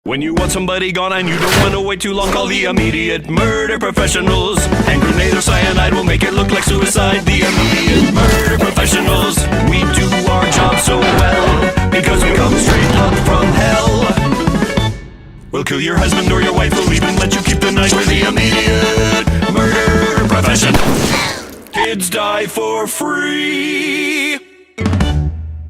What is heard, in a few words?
Jingle; I.M.P; Helluva Boss Original Soundtrack